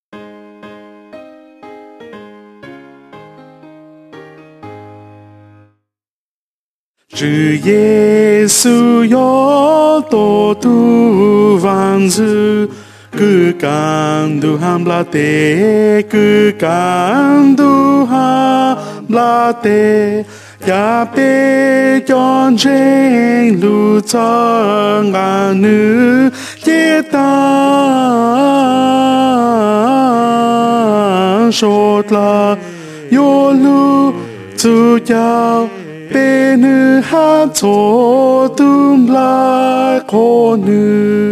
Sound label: soprano